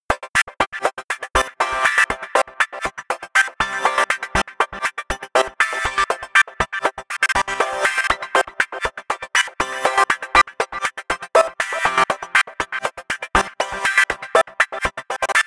sequence glitchy saw synth

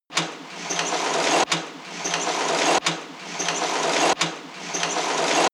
industry machine mechanic dense

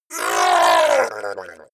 fishman shout extreme pain debilitated

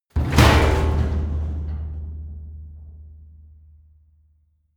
metal container impact large single

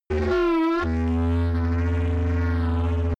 low engine rumble

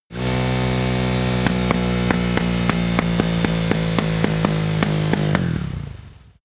motorcycle motorcross decelerate clatter stop

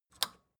record player power release off

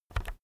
keyboard external key press very fast soft

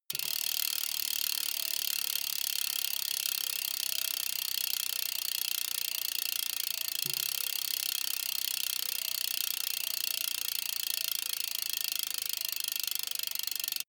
bmx bike freewheel push and slow down

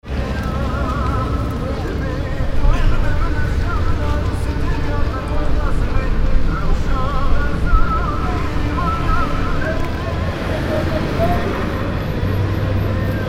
market atmo

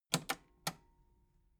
metal button cassette rewind slow

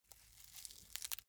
velcro large close

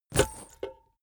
leather metal bodydrop

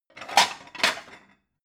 plate multiple take hard

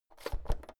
oven knob press out hard

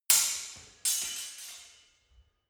sword hit sword single

